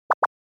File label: Anime Motion, Mid